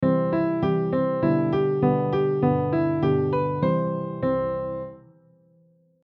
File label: scale melody